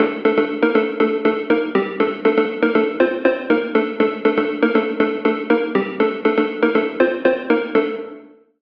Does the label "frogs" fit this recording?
No